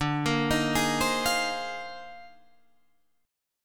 A sharp 11th

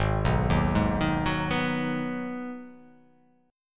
Ab Minor 7th, chord; arpeggiated; JGuitar

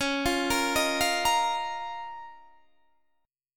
Db 6th Add 9th Db down Guitar Standard; Db6add9 arpeggiated; JGuitar

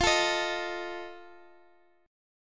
D sharp Suspended 2nd Flat 5th F

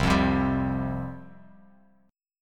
C Suspended 4th C, Bass Standard; Csus4 strummed; 8,8,10,12; JGuitar

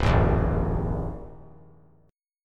C Minor 7th Sharp 5th C; Cm7#5 strummed; JGuitar

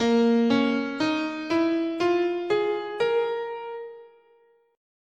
Bb Blues, scale

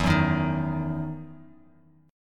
E Major 7th Suspended 2nd E down Bass Standard

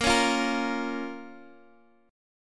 A sharp Minor, Guitar Standard; strummed; JGuitar

